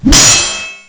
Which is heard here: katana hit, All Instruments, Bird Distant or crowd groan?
katana hit